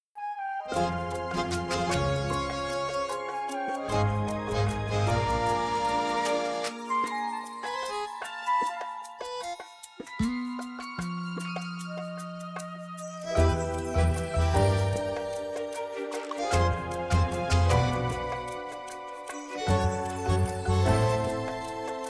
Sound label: Dialogue Mystery; Miraculous Rise of the Sphinx